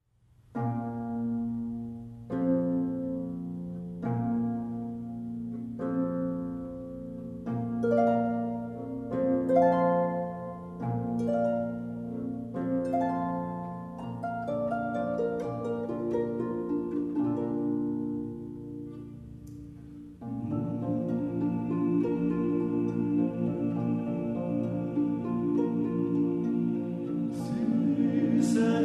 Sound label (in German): Süßer die Glocken nie klingen; Volksweise